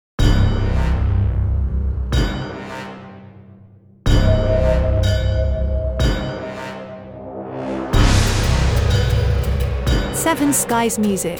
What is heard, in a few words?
Orchestral Powerful tense